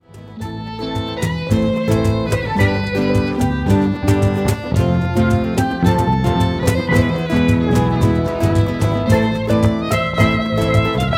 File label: rumba